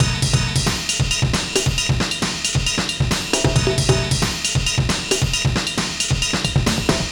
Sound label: Beat - Full, 135bpm; Zero-G Sound Sense Grime Scene; GS Construction Kits; The Hoodrat